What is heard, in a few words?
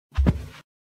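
step, footstep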